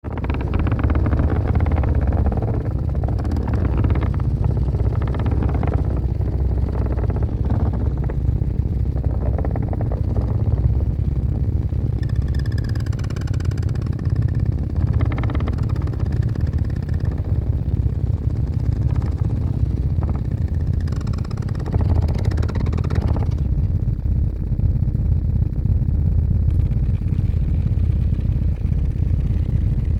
Rumble Low Flabby Speaker, Low Frequency Speaker Rattle Drone; Very Low Frequency Tone Through Speaker , Extreme Speaker Cone Rattle; Rumbles; Sound Design